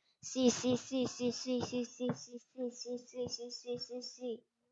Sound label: sea